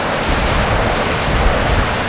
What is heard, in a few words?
blizzard; Hexen II; crusader